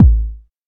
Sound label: tr 606 analog kick drum A key